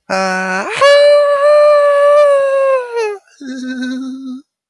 Human sounds Human voice Shout Children shouting, vocal imitation